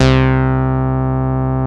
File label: MC 202, BASSES